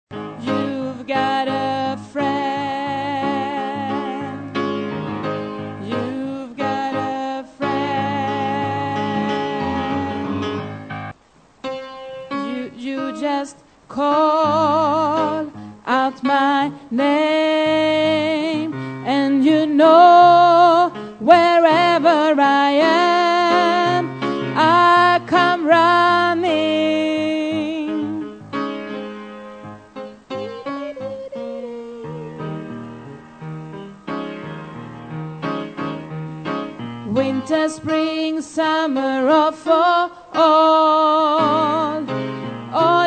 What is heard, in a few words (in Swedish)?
alt, stammor